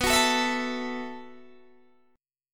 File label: B 7th Suspended 4th B down Guitar Open D